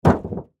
Impact Automobile Hood, Buckle; Crashes; Objects

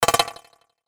CLICK DELAY; Clicks; Blastwave

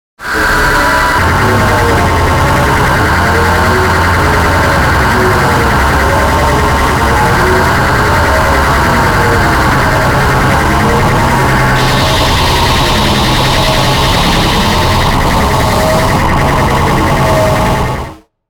Bed Demonic Vibrato Harsh Wash; Production Element Imaging Element Accent Transition; 5.1 STEREO MIX; Blastwave

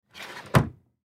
Computer Desk; Computer Desk Keyboard Stand Push In; Typing; Office; Offices; Blastwave

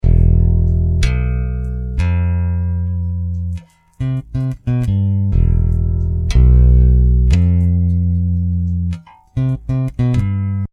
Hip Hop Bass Guitar Loops 90 Bpm E; Royalty Free Guitar Loops

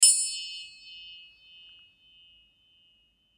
Chimes Ding; Windchime; Blastwave

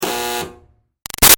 Buzzer Door Junky Short; Alarms; Electronics; Big Room Sound

